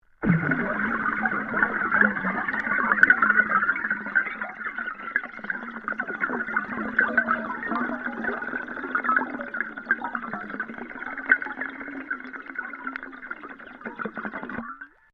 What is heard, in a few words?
UNDERWATER BUBBLES BURST LONG, Air released from compressed air cylinder